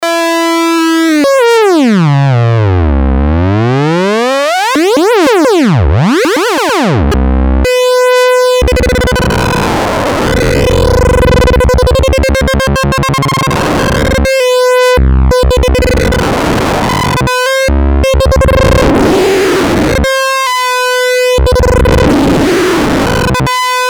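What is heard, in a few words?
Xperimental chaos, Moog Minitaur; analog monophonic synthesizer; Xperimental 1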